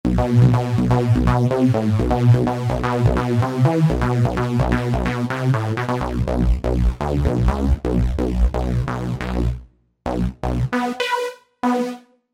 Technoheadz

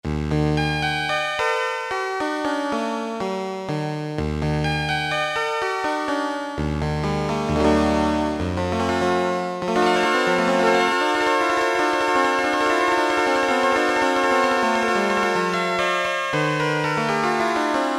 synth. sound, Casio MT-500; Tones

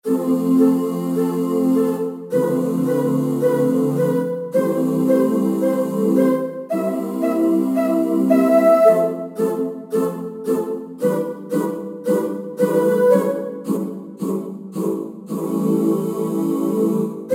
Syn Vox; Roland SC-880; Factory Patches

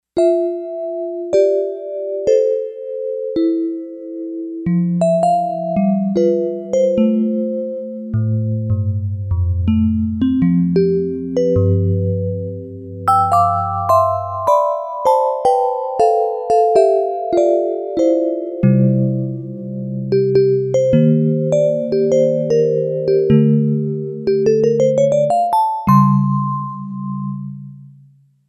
Vibe

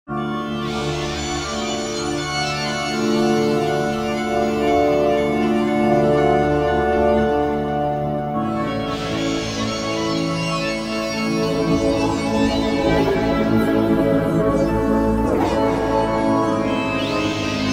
Combinator, Propellerhead Reason 3.0

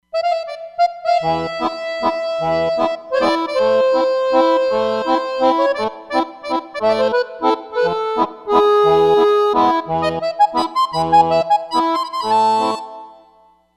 La Seine, Roland Fantom XR patch

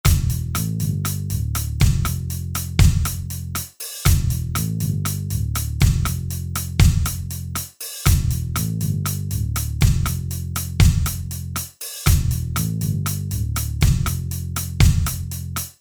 Pop

